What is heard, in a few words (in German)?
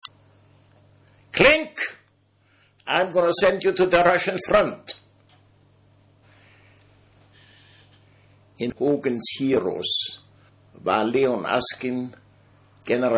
Klink